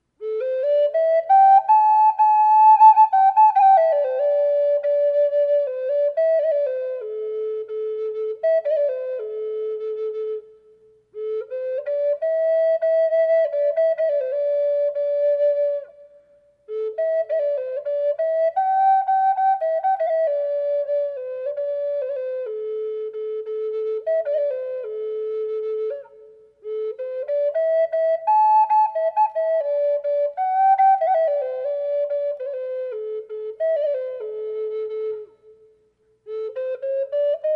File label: buckeye hummingbird reverb, flutes